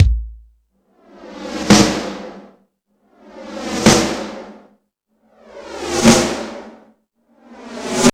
Hip House, drum machine

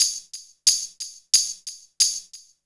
Hattentamb, Synchro Funk, Beats; Sonic Foundry (Sony Creative Software)

